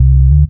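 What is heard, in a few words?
Bass Riff Bass, Loungin House; Equipped Music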